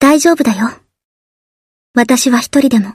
win; freak fortress 2

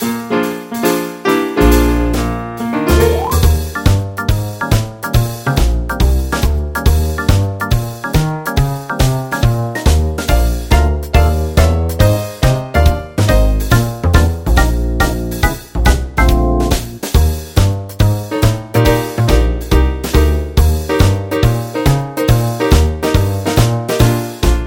casino, music minigame; tf2ware ultimate

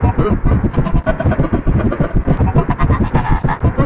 giggling running; itsteals; legs; slender

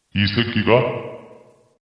stabbed; hoseong

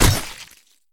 hurt; zombiesurvival; medieval raid; special mutation; arkantos

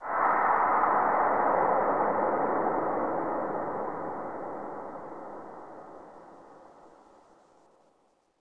stinger; slender skinwalker

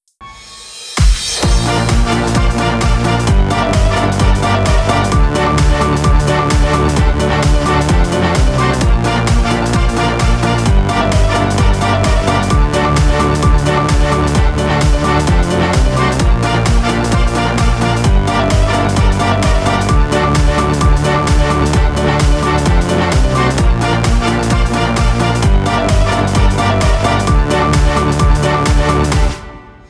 chase theme; bendy

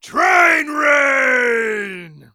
train rain; tf2ware ultimate

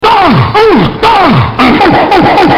ah oh ah

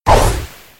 dive jump fx; freak fortress 2; hat kid

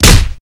hit; slender; yakuza; kiryu

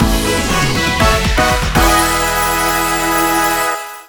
game clear; tf2ware ultimate; music game

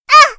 stabbed; freak fortress 2; hat kid

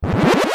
kart grow; tf2ware ultimate; mariokart

